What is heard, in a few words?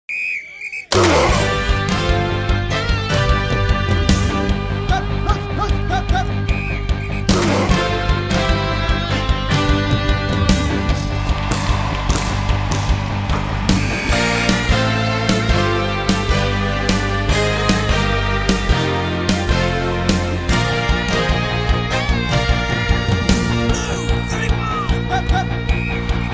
Jingle; Sportingbetusa